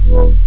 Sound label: lead